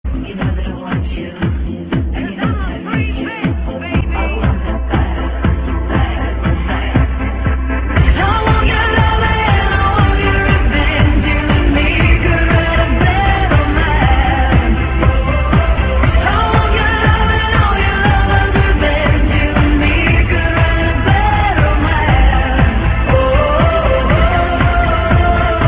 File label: disco